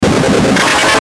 Musical Feedback, Musical Feedback Distortion Sweeper Short; Blastwave